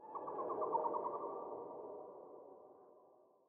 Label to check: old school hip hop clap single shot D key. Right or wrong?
Wrong